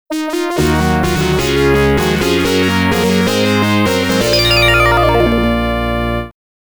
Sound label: Round Clear